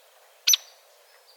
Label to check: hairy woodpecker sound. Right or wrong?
Right